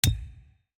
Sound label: Button Bottom Bar Click; Custom UI Sounds; Call of Duty Vanguard